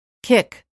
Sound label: kick